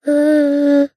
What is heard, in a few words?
human child 15 months boy coo